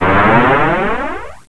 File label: Flange